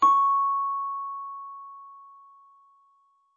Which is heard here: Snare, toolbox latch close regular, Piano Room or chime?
chime